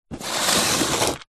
industrial tool box small plastic slide on screed concrete floor